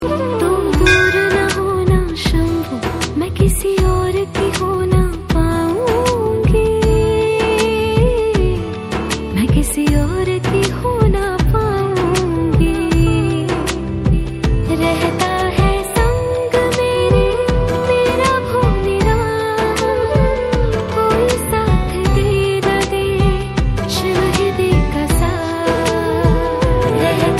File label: Female Version